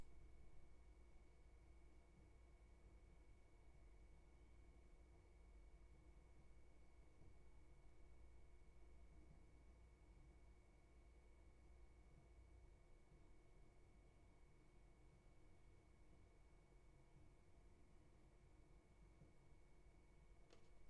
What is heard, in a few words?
roomtone